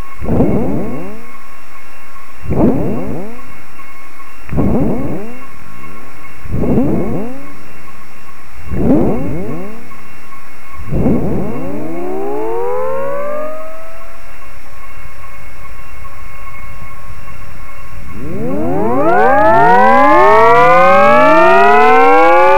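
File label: Frequency Sweep; plasma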